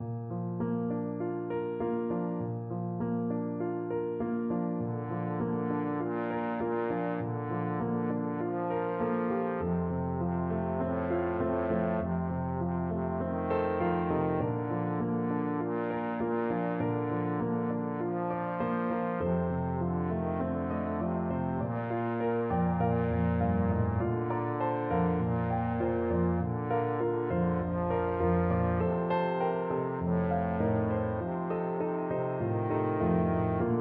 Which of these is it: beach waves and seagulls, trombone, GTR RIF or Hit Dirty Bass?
trombone